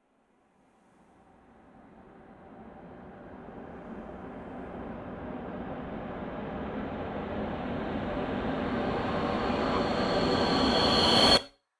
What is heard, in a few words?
Horror Build Up; Waking Up From A Nightmare